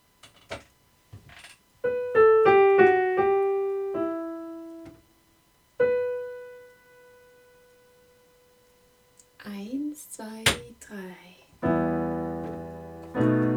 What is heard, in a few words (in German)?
Begleitung